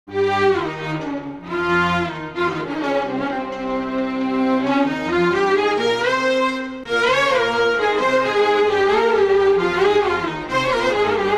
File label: Egyptian Strings